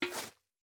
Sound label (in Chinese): 桶装入细雪声